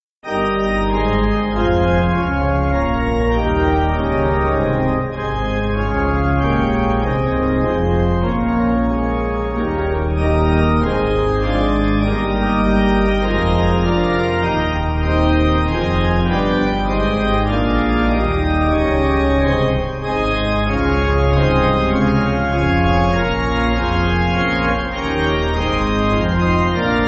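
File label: Pipe Organ, Free Music Backing Files for Churches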